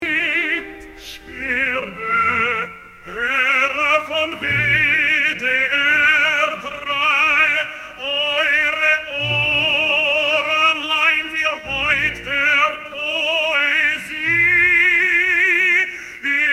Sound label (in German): chorus